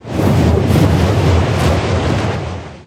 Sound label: sweep tail circle